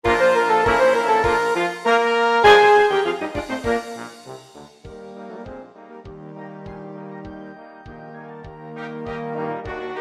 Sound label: Promenaden Polka